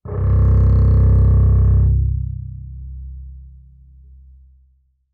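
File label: Bass arco fortissimo sul C C1, Double Bass; MIS Pitches - 2014; Strings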